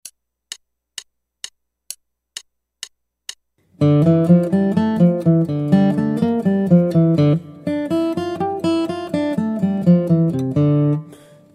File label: Guitar